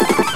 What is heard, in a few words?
Explosion; Revolution X